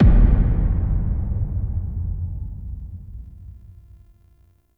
Sub Hit; Ambience; Warframe; Shadowgrapher

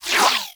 Beam Retract; Warframe; Corpus; Corpus Ship Remaster; Glaxion Beam Moa

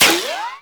Exalted Sniper Ricochet; Warframe; Frumentarius

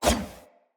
Player Short Sword Whoosh; Weapons; Swords

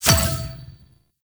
Placeable Success; Ambience; Warframe; Clan Dojo

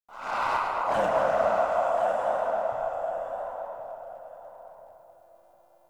Ext Chatter Painful Scream; Ambience; Misc Outdoor; Warframe; Infested Eris

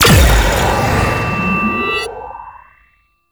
Heavy Slam Ability Charge Up; Warframe; Duviri; Dax Heavy; Abilities

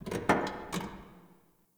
Parking Lot Car Door

